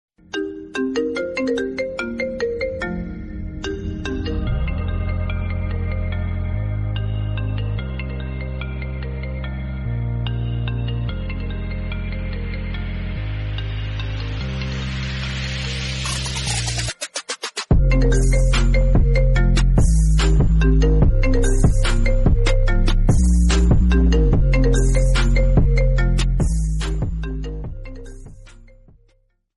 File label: iphone; iphone 13 original